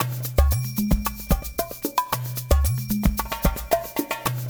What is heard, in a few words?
LOOP 2 L, Percussion; InVision Interactive; GRUNT